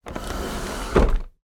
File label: household table coffee pine slide carpet floor